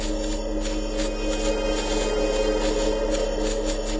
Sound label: endlevel beam